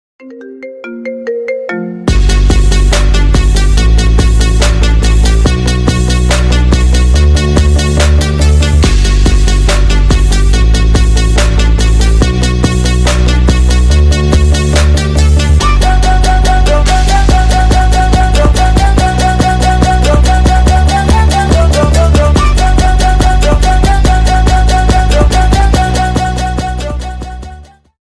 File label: apple iphone 6s plus ringtone